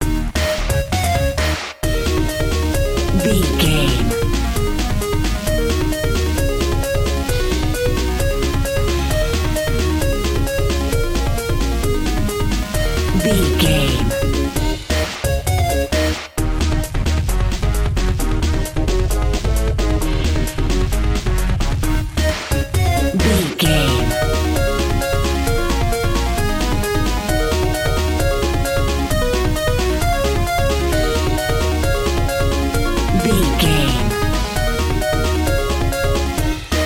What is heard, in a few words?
big boss battle video game